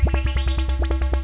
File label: synth